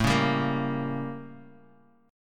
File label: A 7th Flat 9th A; strummed